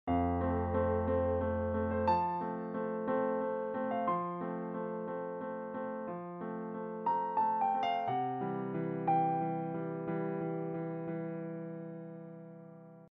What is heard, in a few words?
Piano Grand piano; Piano app by Yokee